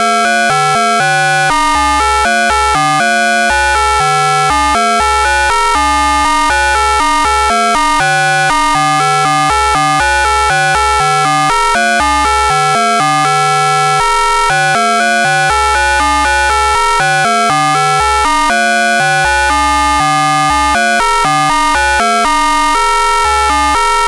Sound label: broken tone